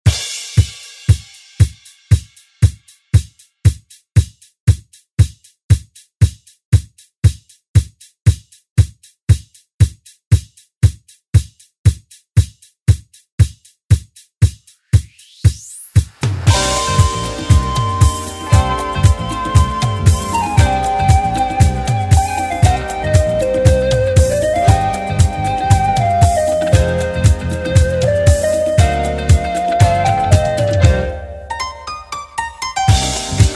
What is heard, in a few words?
80s Re Drum; DJ Mhark